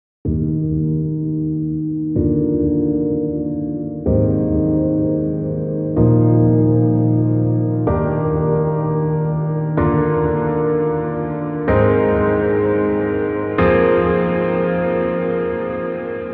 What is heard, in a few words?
for EDM